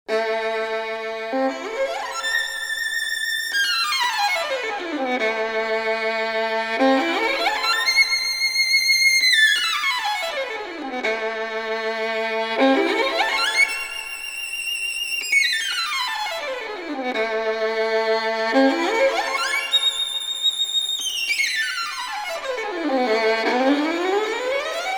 violon

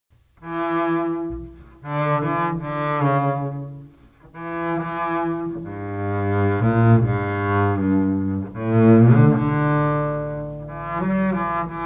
contrebasse

